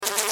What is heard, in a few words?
fly buzz